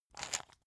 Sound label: pepper mill soft; gastronomie; soundpool